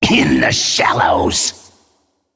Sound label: Male Naga Attack